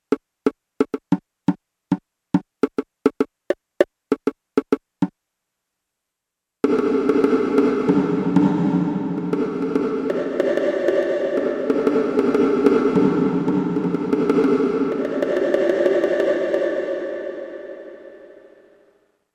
.8 Sec. SMALL BRIGHT, Alesis MidiVerb

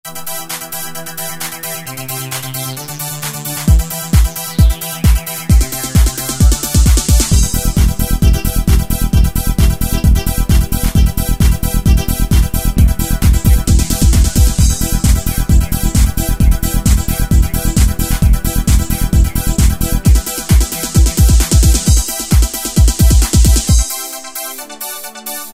Euro Techno; Preset Styles

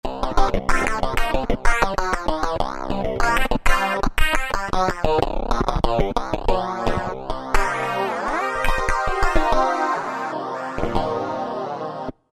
Claviforma, Roland V-Synth; Factory Patches

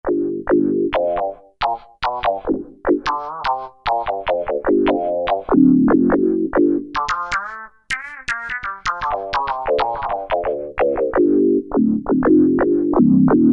Korg T3

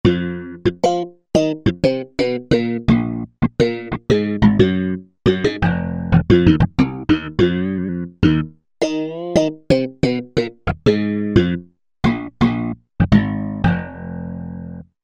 Slap Zap

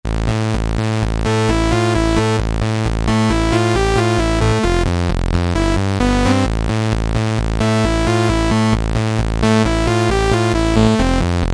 saw wave; Roland SRX-05 Supreme Dance

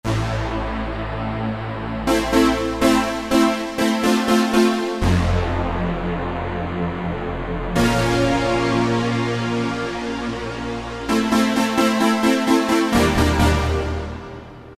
Digital Atmosphere; Roland PN-D50-04

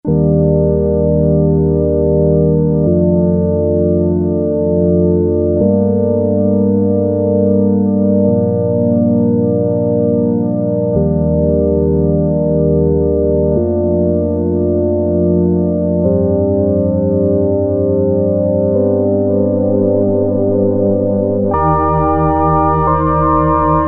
Pulse-Width Mod, Prophet-5; Sequential Circuits Prophet-5 (Ver.3); Factory Patch

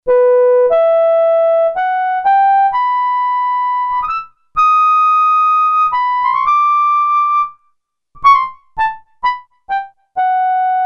flute, Korg DW-8000; Factory Patches

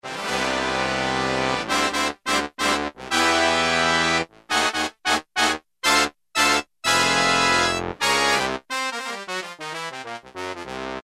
Oct Tp-Trb, Roland U-110; Factory Patch

